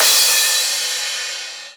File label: Crash, Cymbals